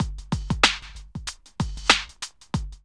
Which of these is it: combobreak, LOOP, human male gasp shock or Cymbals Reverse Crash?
LOOP